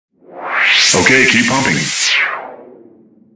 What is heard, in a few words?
Air low; Pop It for Gold